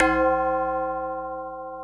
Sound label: T BELL, TONAL PERCS; Crypton - DrumBase5000